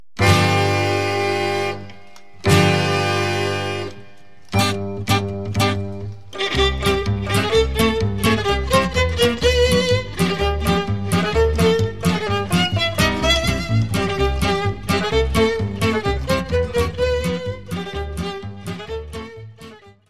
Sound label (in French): Grand Square, Etats-Unis